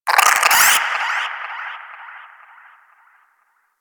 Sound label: Cryptilex Vocal Captured Lift Away; Warframe; Hunting Critters